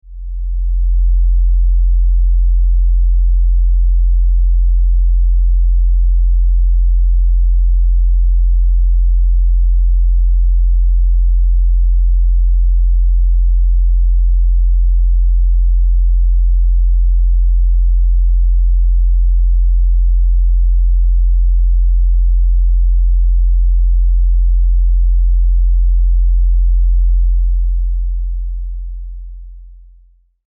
Science Fiction Low Buzz Deep; Deep Tones; Sound Design

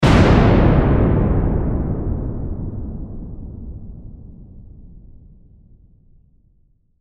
Lightning Bolt Explosion Blast Large Thunder; Weather; Lightning Sound Effects; Blastwave

